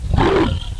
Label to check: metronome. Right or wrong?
Wrong